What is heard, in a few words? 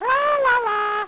laalalaa